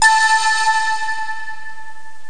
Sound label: Crystal; Paris Virtual Tourism